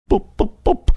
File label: boop boop boop